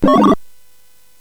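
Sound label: Nebulus, MOS 8580 SID chip music, games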